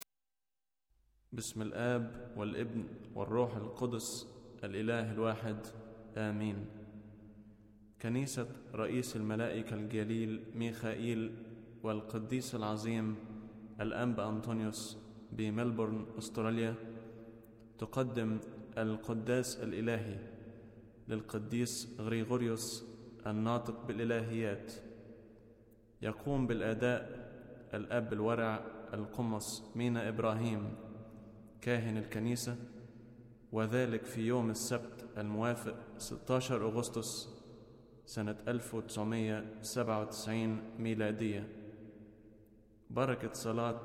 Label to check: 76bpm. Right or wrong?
Wrong